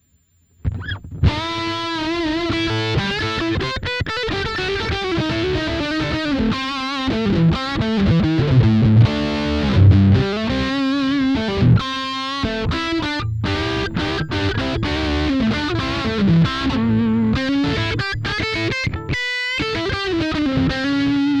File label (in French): Solo gain au max, ampli guitare; Amplug Classic